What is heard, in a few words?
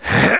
JUMP